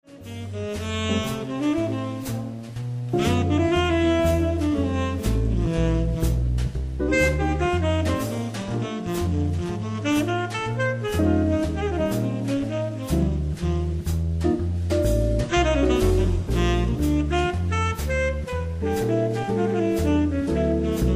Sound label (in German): Trio plus Sax Swing